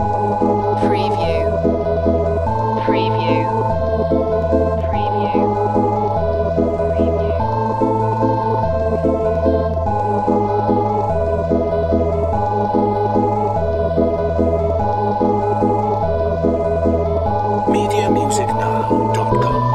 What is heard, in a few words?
funny little things